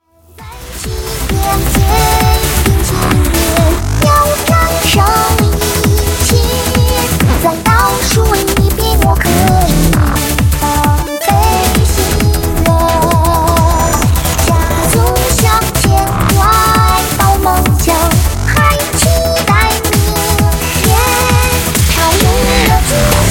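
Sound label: background music crossfade; Invaxion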